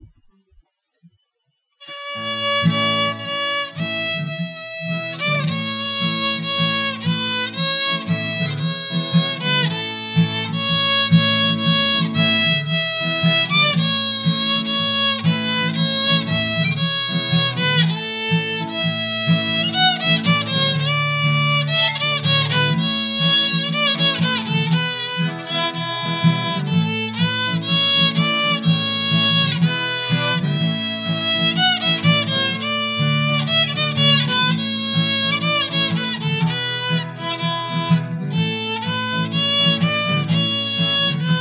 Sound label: Violin